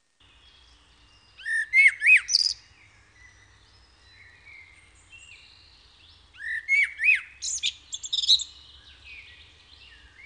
Feketerigу; Madarak

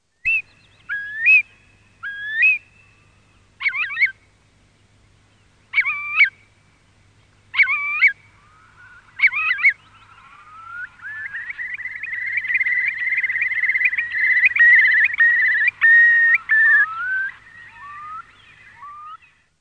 Nagy pуling; Madarak

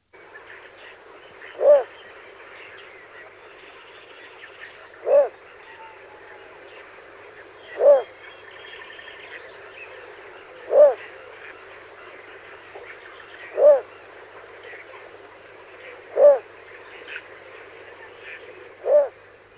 Tцrpegйm; Madarak

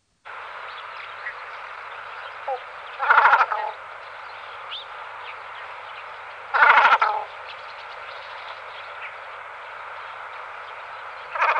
Kбrуkatona; Madarak

